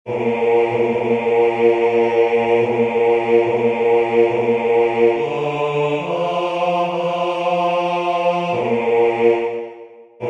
Basse; Gounod; Benedictus

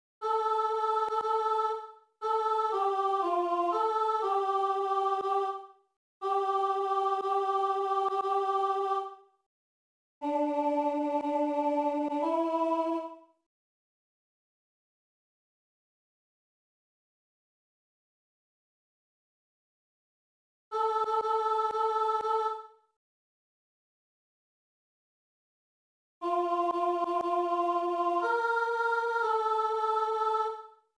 Gloria Alto; Rossini; Messe Solennelle

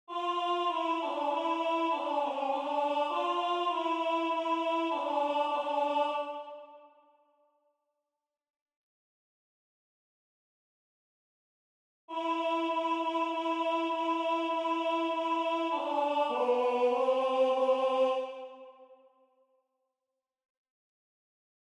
MAGN Tenor; Durante; Magnificat

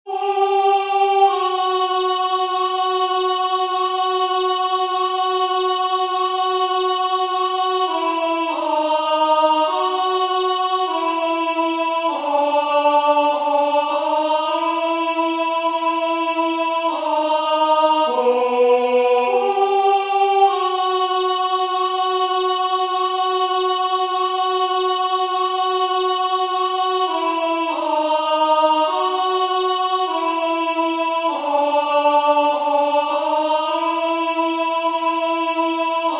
Alto; Bach; Cantate 4

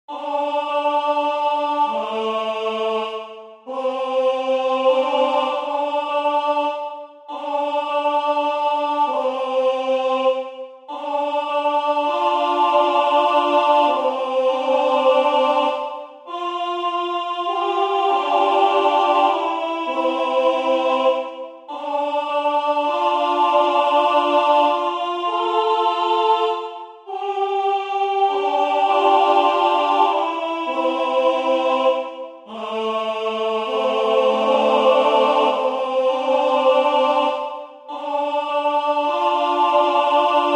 Alto

